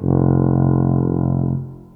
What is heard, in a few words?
tuba low